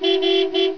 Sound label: HORN, 汽车